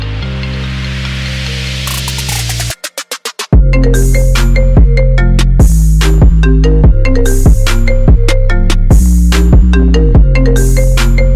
ring tone

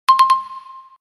Loop Msg Alert

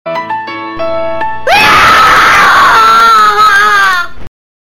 Halloween Nokia

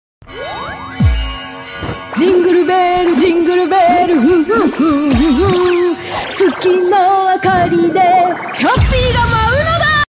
Jingle; Rukia

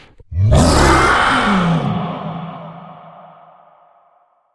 Roar

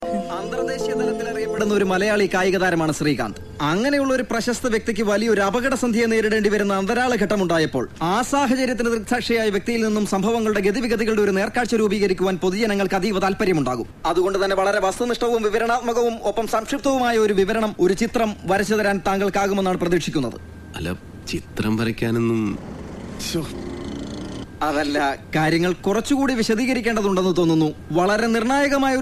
Malayalam Newstime

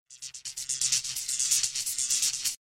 Shock

